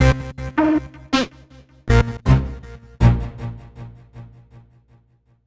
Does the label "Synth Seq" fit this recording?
Yes